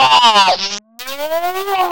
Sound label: Monster Moog, Underground UK House & Electro, Synths; Sonic Foundry (Sony Creative Software)